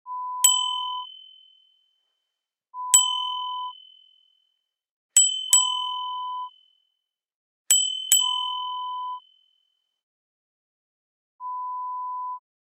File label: Bicycle Bell